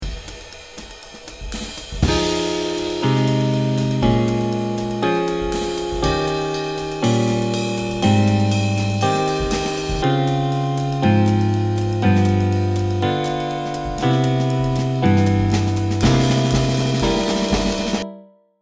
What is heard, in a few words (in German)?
Wechselbass mit Harmonien